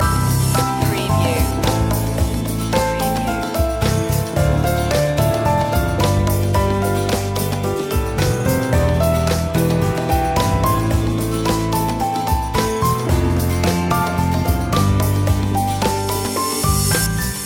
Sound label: jazzy loop; media music now